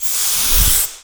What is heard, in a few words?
AIR SPRAY; InVision Interactive; The Piano